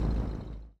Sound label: stop, vehicles